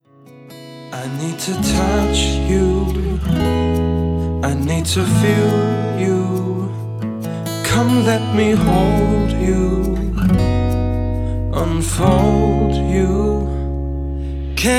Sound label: Heartbeat; A Walk In The Park